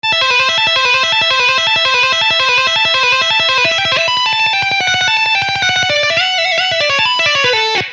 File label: Lick